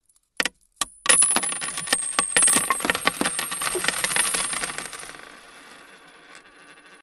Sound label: pump coin